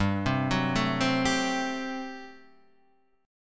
G Major 7th Suspended 2nd Suspended 4th G down Guitar Open D